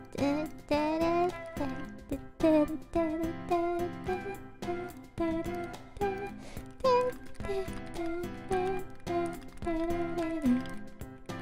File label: Singing; IRyS